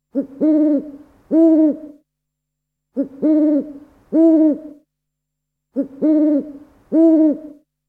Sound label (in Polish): sowa